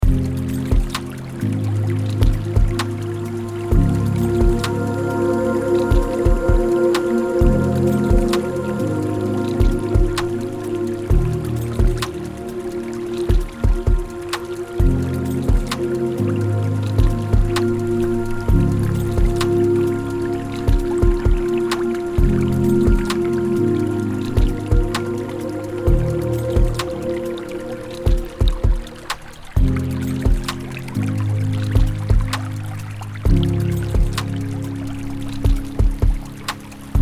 piano piece with stream sound effect background 130bpm